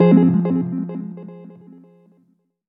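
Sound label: Fm chord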